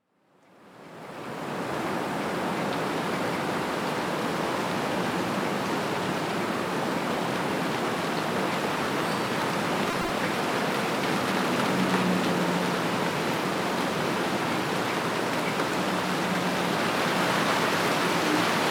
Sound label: thunder rain